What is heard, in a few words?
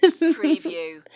Female Giggle